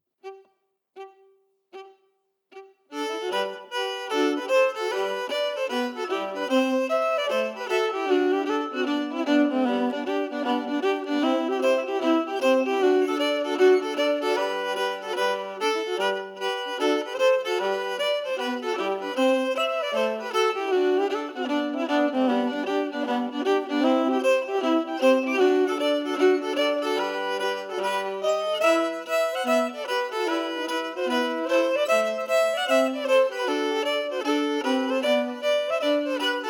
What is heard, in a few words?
Westward Willa harmonies emphasized